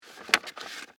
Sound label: Manila Envelope, Office, Envelope, Manila, Open, Clasp, Lid; Paper Sound Effects; Offices; BlastWave